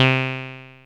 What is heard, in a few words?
ACID C3; Best Service Alpha Dance vol.2